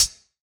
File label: Hit, Percussionz